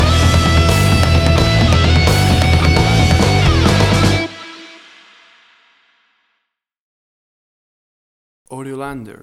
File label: Angry Heavy Rock Metal Music Stinger